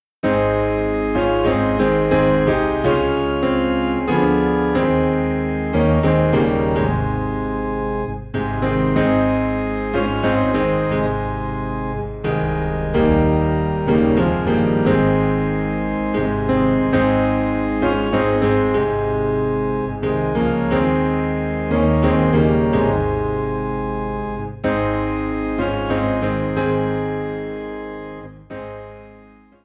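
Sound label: piano and organ low in A flat; Jesus Paid It All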